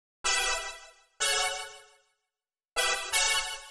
125 Soft pad A, Keys, Nu Jazz House; PowerFX - Nu Jazz House

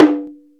shimedaiko, Ethnic Percussion

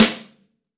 SN, LA SNARE, Snare

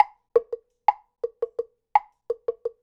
Temple Block, Latin Percussion; Sonic Foundry (Sony Creative Software); Temple Blocks

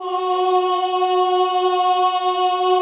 CHO F#3, CHO Ooh; Sound & Vision; Gigapack I CD 2

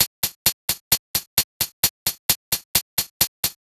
131 Retro Disco Hats, Drum Loops; Zero-G; Soundclash Nu Dance Electro; Acidized Wavs; 131bpm

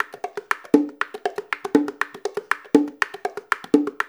loop, bongos; InVision Interactive; Percussion Slam

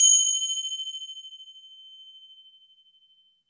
FINGER CYM C; AKAI S-Series CD-ROM Sound Library VOL-5

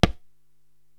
Tabla Mute, Korg TR-Rack

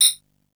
Digi Whistle; AMG - Prototype Drum & Bass; Shakers & Rattles

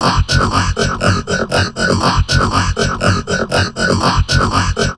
VOCOD, Drum Loops Crazy Processed

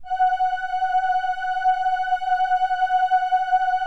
LAMBDA, String Machines

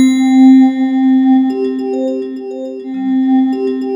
ICE MAN C3, ATMOSPHERES; Sound Gallery - Charged

